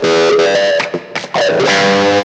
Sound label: GTR